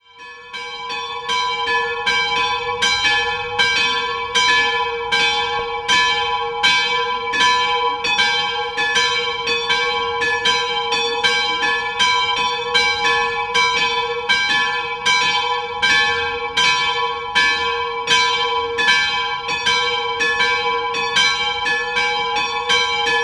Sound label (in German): Schönhofen, glocken